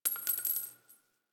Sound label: Target Practice Shell Casing D; gameplay; Warframe; Nineteen Ninety Nine; Quincy